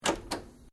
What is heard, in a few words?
Switch Washing Machine Press Double Click; Various switches; Appliance Sound Effects; Appliances (Sound Effects:Household)